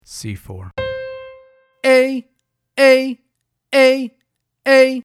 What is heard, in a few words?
Attack n Release C4 to A4